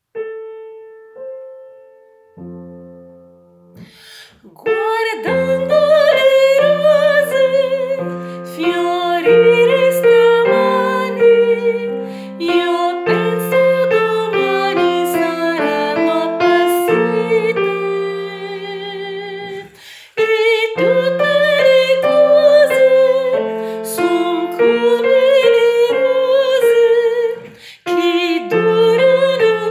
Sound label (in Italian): soprani intro parte alta